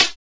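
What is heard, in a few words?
909 hat closed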